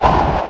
crawler attack; Barotraumatic; Cthulhu; InsanityMonsters; Hallucination1

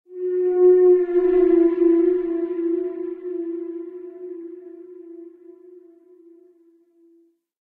whale idle; Barotraumatic; Shadowwhale

